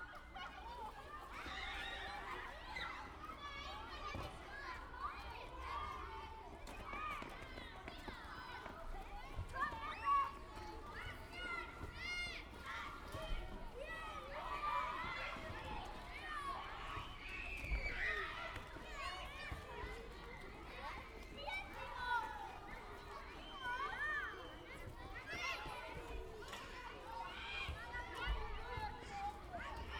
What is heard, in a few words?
Schoolyard Noise binaural